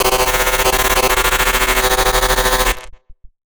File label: Casio, Glitched, malfunctioning synth